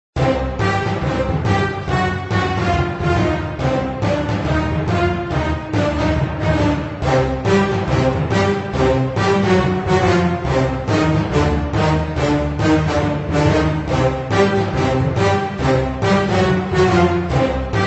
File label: Brass; Alice: Madness Returns unreleased original soundtrack; Stacs